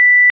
bip; svencoop; sa13

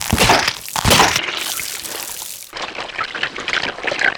fleshy; svencoop

